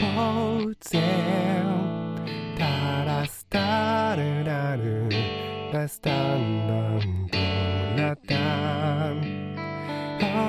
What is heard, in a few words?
first a guitar